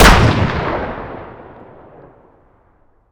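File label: weapon dmr fire player atmo ext; gmod; viper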